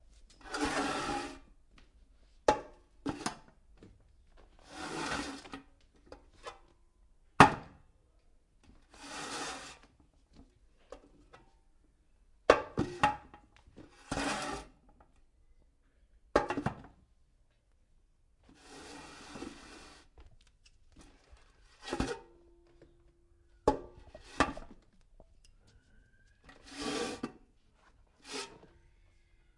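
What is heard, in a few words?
bar stool sounds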